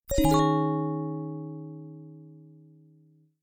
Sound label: Nokia Message; X100